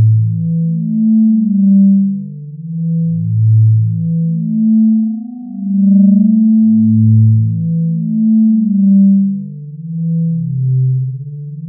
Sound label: gentle melody